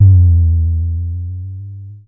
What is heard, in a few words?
Drum X Tom, Pearl Drum-X